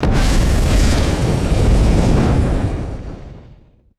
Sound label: sudden explosive fire burst whoosh